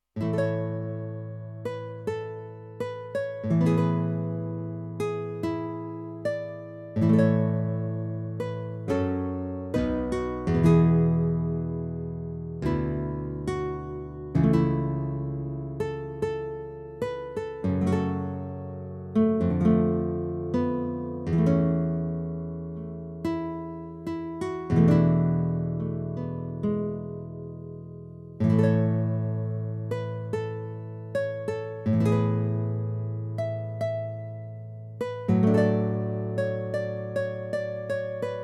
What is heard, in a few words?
Guitar